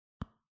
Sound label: Touchscreen